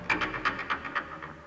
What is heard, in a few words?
noise